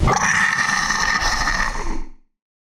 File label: attack; Barotraumatic; Crook